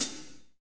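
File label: hi-hat, Kit Wet; Northstar - Drumscapes Roland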